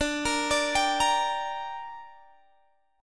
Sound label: D Suspended 4th Sharp 5th, Guitar, Open G